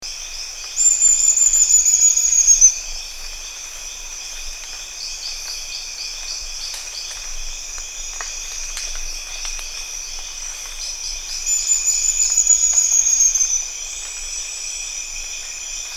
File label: Rio Madeira stipplethroat; پرنده گلونقطهای ریو مادی